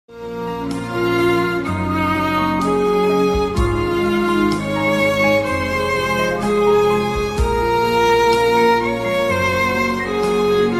dramatic violin

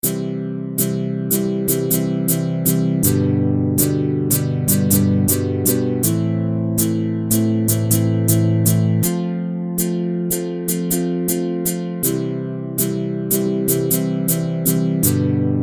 natural guitar; Country

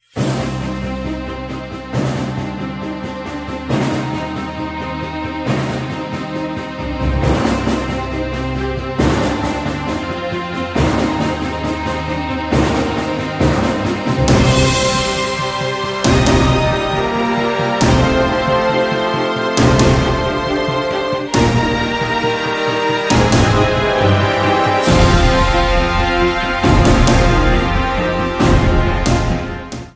galactic music ringtone

